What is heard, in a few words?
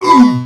Male Hurt Robot; Mars Sortie (Arcade) (gamerip) (2018)